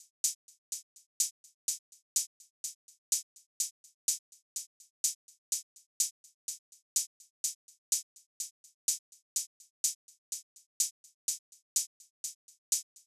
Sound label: Drum Loop - 125 BPM Hihat; Cymatics; Antidote Drum Loop